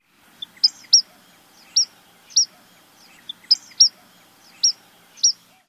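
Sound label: vermilion flycatcher calls repeated twice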